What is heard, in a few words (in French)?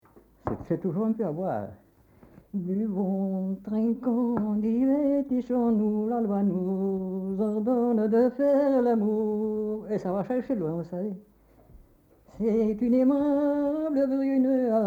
chanson